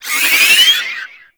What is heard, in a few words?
Hand Bomber Proj Warning; Warframe; ManInTheWall